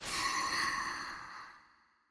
SE SOUL VACUUME

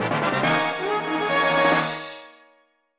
Rank Up! Jingle; Fossil Fighters Champions; Nintendo DS

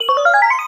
ui loop; Just Dance 2018

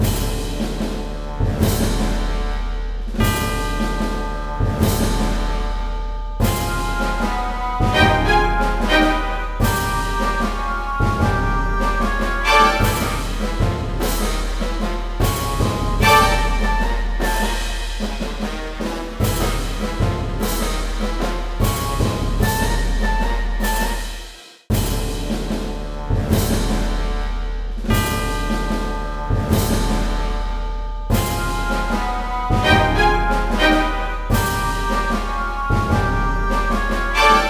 Outro Music

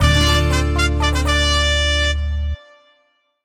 mission start; Western

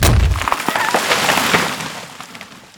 Crushing Tree; Desert Rats vs. Afrika Korps; SFX Others

